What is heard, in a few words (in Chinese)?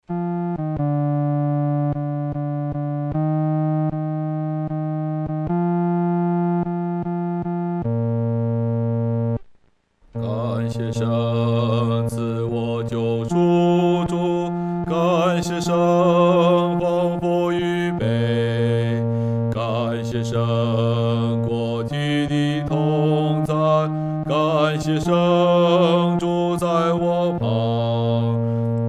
独唱 第四声